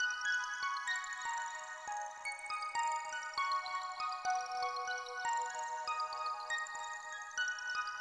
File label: Icy; Blockland